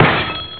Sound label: bottle smash